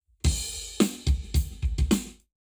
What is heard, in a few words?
Lab Wet, Drums 0deg